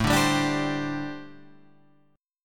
G Suspended 2nd Suspended 4th

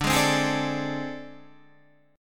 A Minor Major 7th Double Flat 5th D, chord

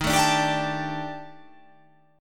D sharp Major 7th Flat 5th, down, chord

